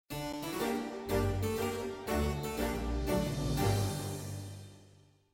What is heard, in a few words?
Voyage Complete Harpsichord; Harpsichord Rendition; Sea of Thieves